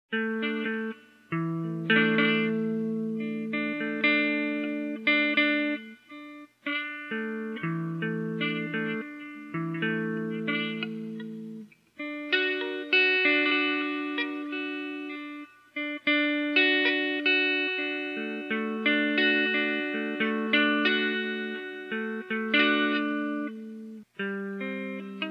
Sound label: guitar play music; gamerip; The Sims 2